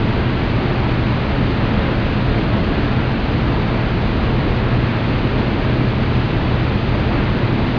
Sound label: transit